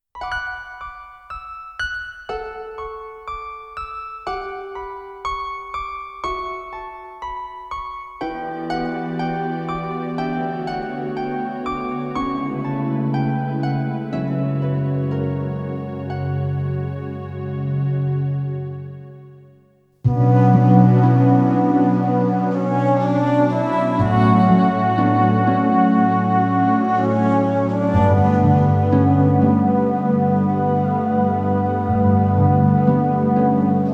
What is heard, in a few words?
sad song; Down the World; Episode 1